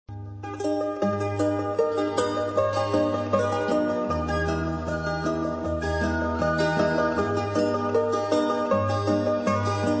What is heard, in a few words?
melody